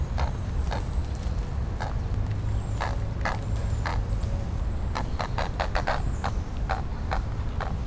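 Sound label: Ardea cinerea nonaggressive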